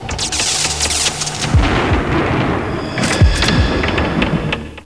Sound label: plasma